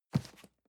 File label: cardboard box medium grab; objekte